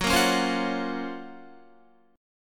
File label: Gb Major Sharp 11th, Guitar; GbM#11 strummed